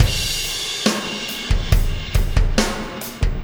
Impact Crater Beat, Processed Drumkits

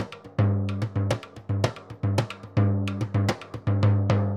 Surdo Murga 110 bpm; Best Service; Festa Latina VOL-1

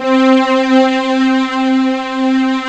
ELG STR C4, ELE STR

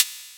hat, Techno 138 BPM

